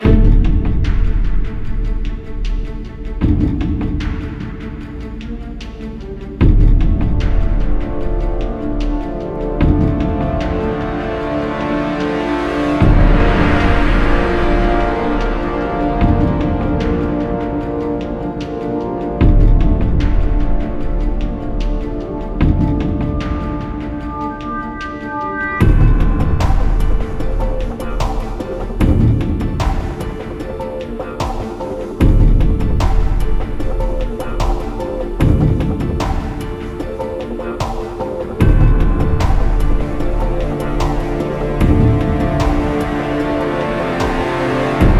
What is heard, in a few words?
Loading Music; frontlines fuel of war gamerip 2008